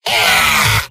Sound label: pain sharp; heavy; video games; source engine; tf; mvm